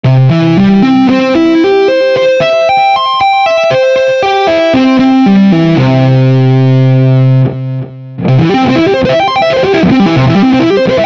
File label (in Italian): Do maggiore con ribattuti